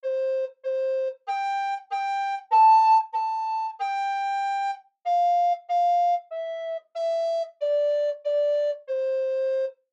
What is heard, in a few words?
twinkle, twinkle, little star recorder solo; Full of Stars Little Planets